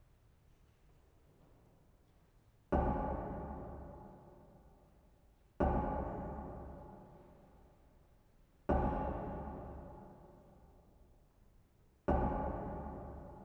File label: Soundscapes